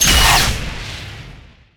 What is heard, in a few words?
Banshee Mine Explosion; Evolve; Kraken